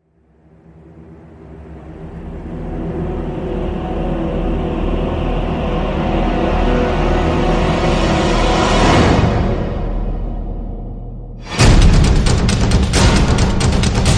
Drums Black Eye

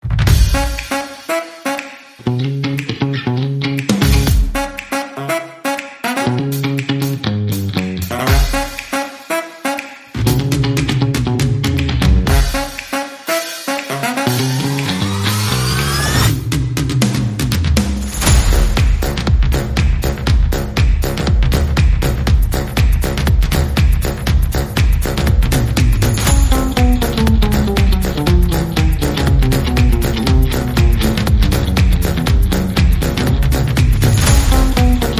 No Vocals

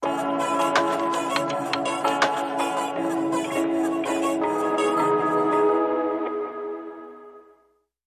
Drums, Link Sting

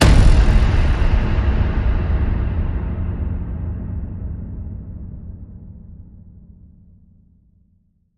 hit big

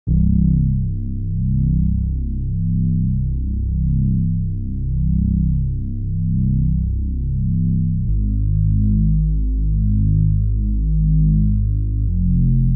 Bass Only

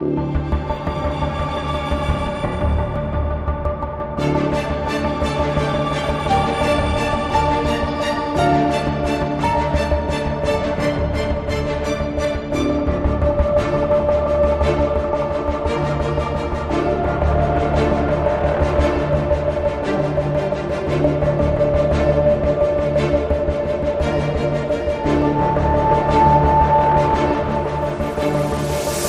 Tension in the Air, No Perc

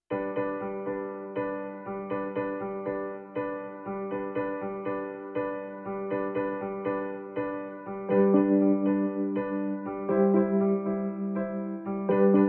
No Melody and No Perc

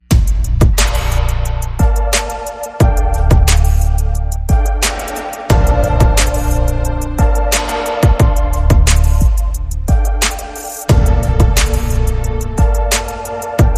No Vocal

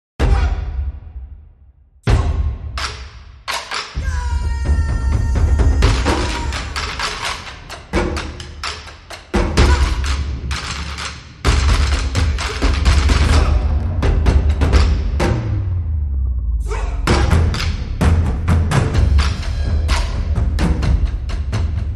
Drums and Voices

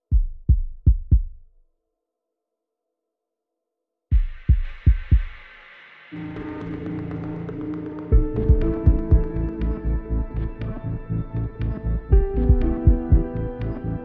No Strings No Pads

